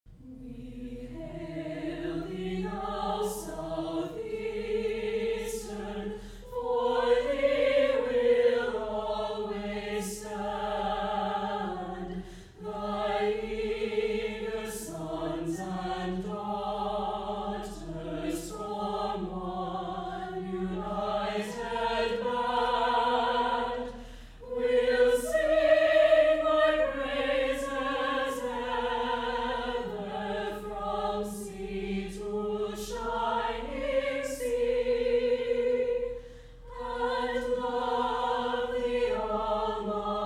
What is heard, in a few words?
alma mater vocal; fight song